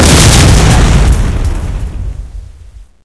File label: explosion; hl2; impact